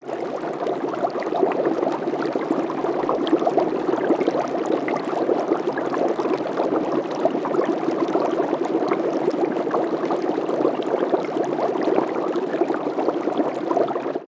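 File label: underwater bubbles released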